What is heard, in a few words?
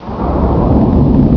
crash; Sound Library 2000; Wayzata Technology